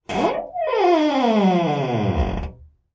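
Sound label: door creak small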